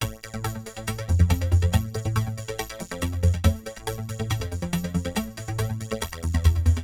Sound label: TEKNO 140; Techno2